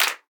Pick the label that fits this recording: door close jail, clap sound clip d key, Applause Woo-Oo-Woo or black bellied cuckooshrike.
clap sound clip d key